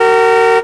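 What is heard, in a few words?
auto medium car horn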